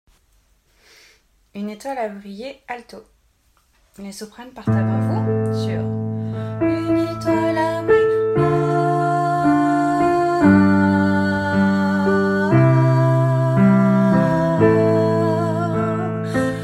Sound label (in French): alto; 263- Une Etoile a brillé